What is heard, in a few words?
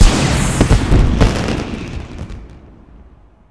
Ball Sounds Ball; pangya fantasy golf